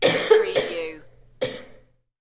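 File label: Lady Cough 3x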